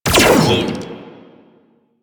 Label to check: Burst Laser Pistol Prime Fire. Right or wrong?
Right